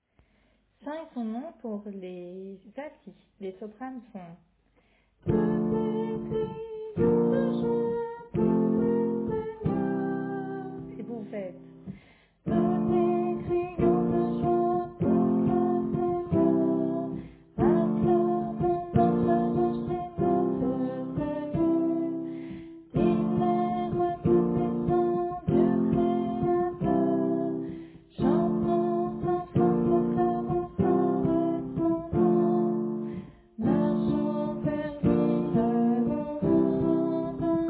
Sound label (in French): Alto